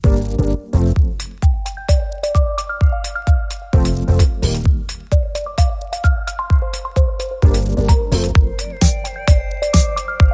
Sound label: Techbeat